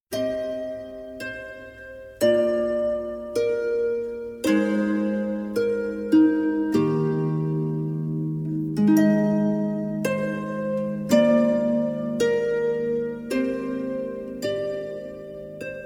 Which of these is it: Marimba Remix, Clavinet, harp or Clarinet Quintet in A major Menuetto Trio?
harp